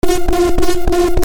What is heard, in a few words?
SCIFI